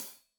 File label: Hat Loose, Hi-Hat, Cymbals Stereo